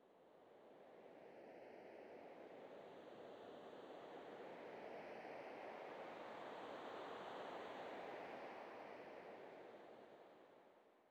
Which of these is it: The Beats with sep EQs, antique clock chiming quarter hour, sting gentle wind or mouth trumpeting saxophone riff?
sting gentle wind